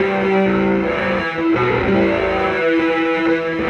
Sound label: Fierce, Electric Guitar; Sonic Foundry (Sony Creative Software); Friendly Fire; Wrath